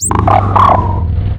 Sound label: launcher draw, weapons